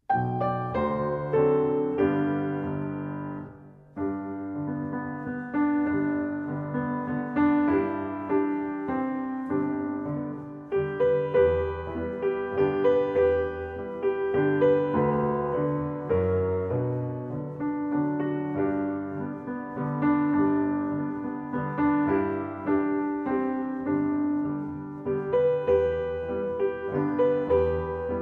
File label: Piano Accompaniment; 2-17 Waltz; Suzuki Violin